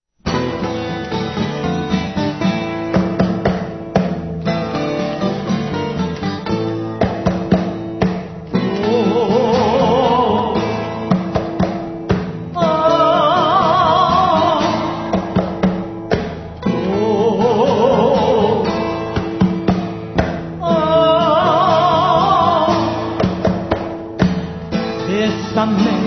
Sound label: triplets